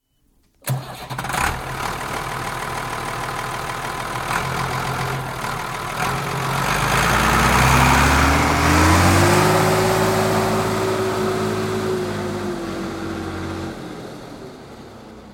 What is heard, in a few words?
Ford 7810 Tractor, exterior, starts, departs, moves around yard, idles, door banged; Doors; Doors Tractors; BBC